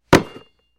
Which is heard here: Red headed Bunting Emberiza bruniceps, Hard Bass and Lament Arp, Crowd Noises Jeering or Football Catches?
Football Catches